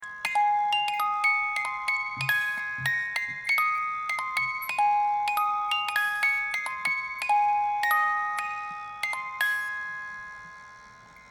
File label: Musical box